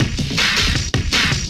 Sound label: Loop, Drum & Bass, 160 BPM